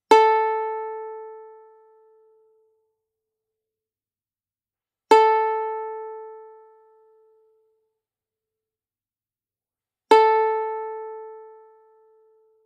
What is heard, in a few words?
ukulele a string sound example